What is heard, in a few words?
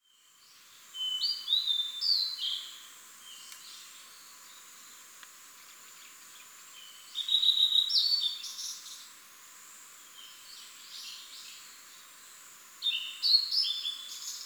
ooruri, bird song; オオルリ; さえずり①; 新潟県東蒲原郡阿賀町川口 森林; J-eco 鳥のさえずり図鑑